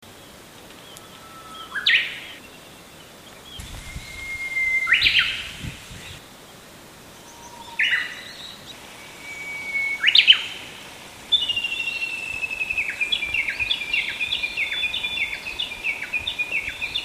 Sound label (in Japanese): uguisu